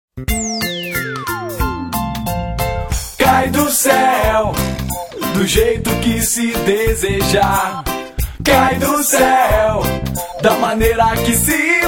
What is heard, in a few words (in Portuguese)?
jingle; cai do ceu moveis